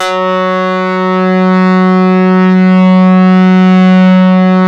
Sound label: leads; Masterbits; Vintage Voltage